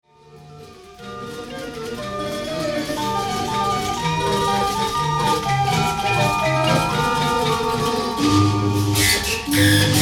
exp instruments

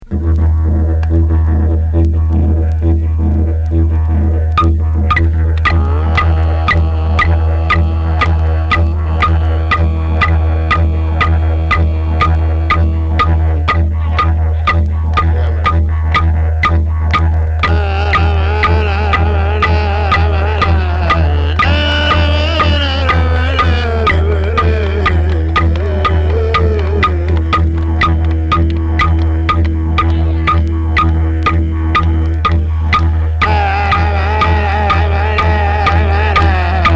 didjeridu

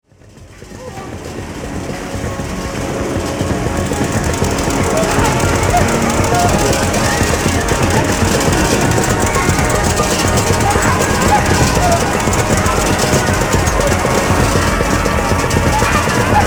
rhythm and noise

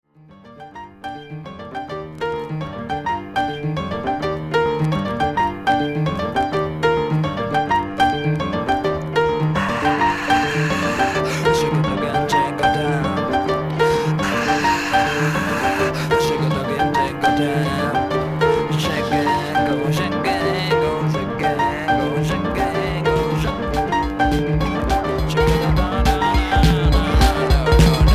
monofonic orchestra

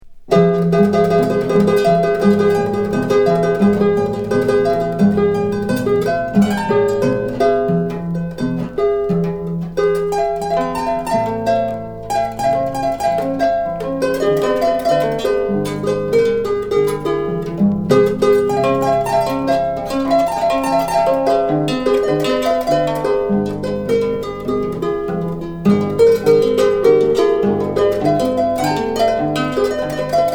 inca harp

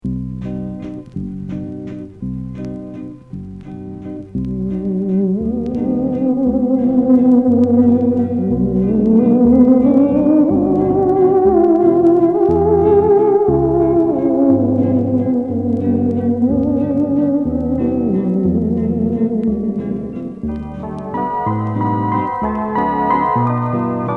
orchestra

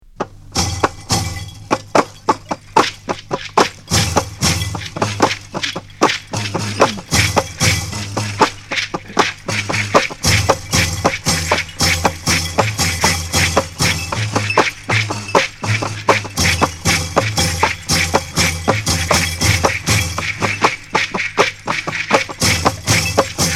senufo music aphon

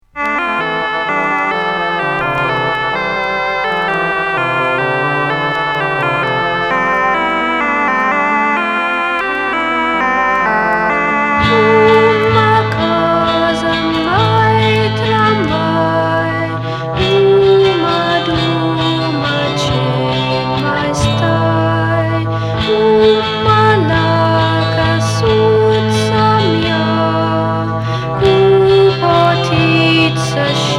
rimarimba on dry

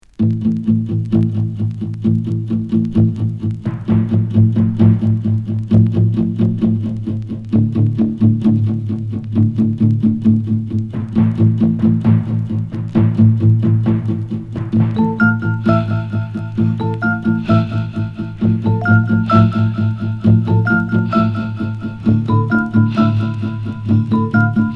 ambient

